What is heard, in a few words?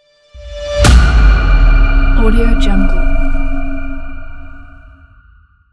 ambient hit logo